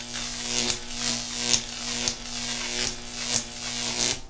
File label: Shock; mekanism